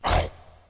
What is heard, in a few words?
bite; smite; cerberus